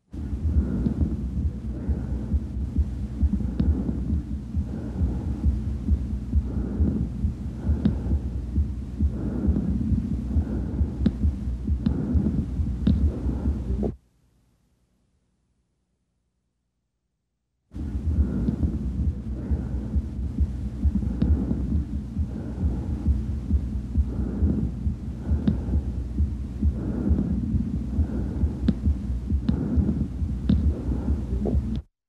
Ruido bronquial lób inf izq

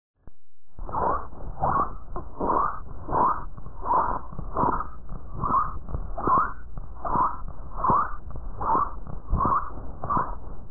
Estridor Recien Nacido

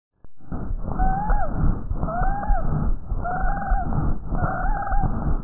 Sbilicancia Lactante

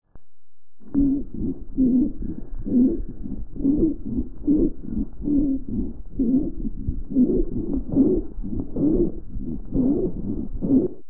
Sibilancia Lactante

